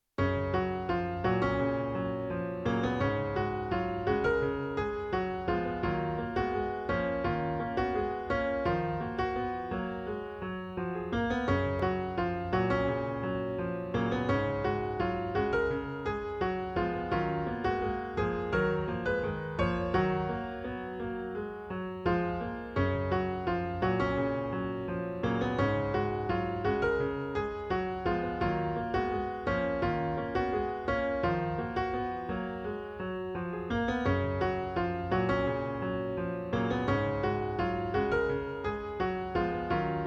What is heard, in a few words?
daily piano